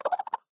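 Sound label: chipmunk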